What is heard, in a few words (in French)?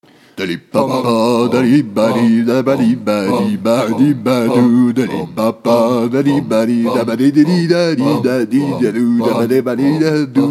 Chanson; Metal Gear Taverne